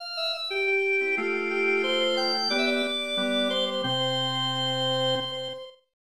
Save Fanfare; Lady Stalker

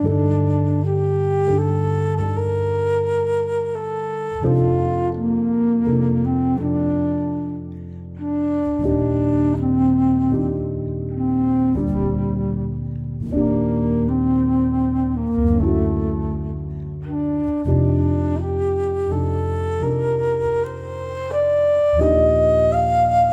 loop 82bpm; theme duty

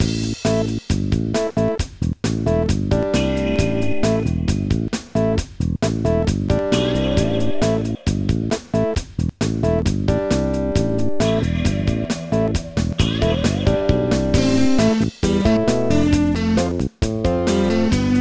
Rock Bass; Chuck E. Cheese's Party Games; nds gamerip

